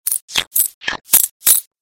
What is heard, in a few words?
monster spider poison